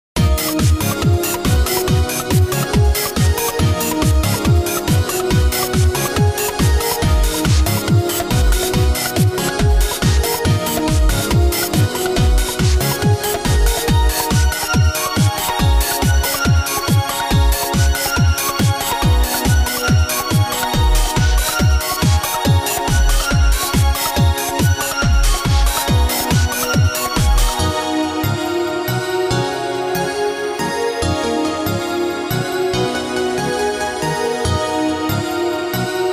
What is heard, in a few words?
Fighting Movie Turning the fight around Royalty Free Music